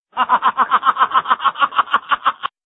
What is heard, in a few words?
laugh